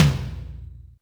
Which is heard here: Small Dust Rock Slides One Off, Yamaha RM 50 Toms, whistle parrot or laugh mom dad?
Yamaha RM 50 Toms